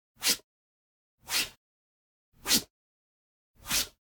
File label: Generic Slide; boom library; The Interface